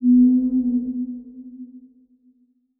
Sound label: Title Transition Ghostly; Production Element